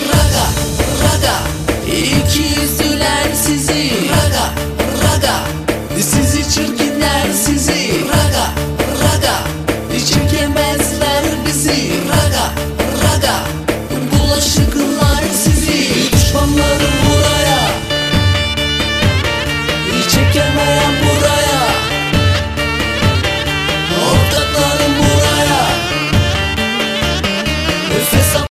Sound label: raga; jazz